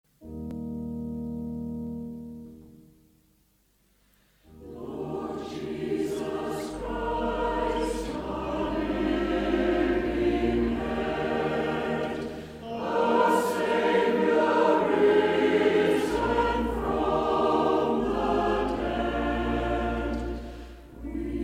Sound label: Choir, hymns